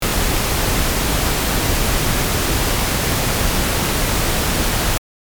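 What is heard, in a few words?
pink noise, Rumble on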